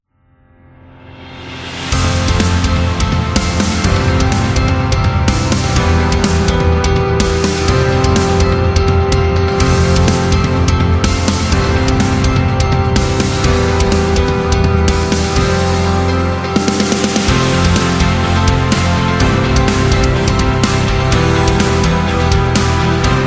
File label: Motivation Dynamic